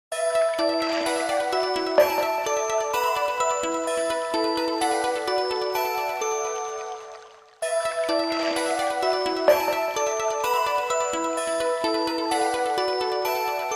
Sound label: Japanese background music; Nokia; audio themes